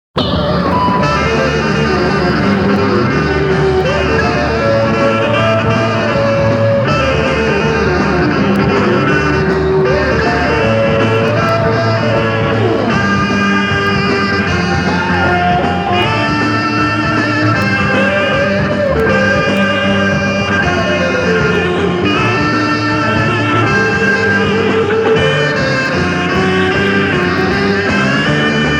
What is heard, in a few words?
instrumental shuffle